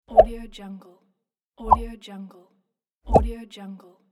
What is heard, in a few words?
smartphone message sent